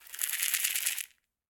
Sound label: Caxixi FX; Shaker